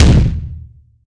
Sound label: large room bass drum single shot d sharp key